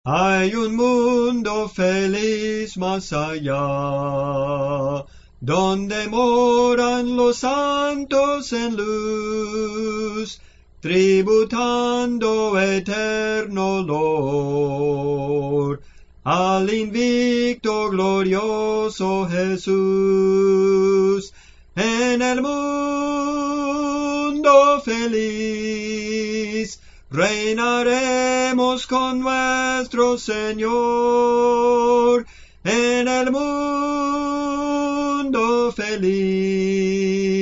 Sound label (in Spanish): Soprano, Cantos Espirituales; Soprano (melodía)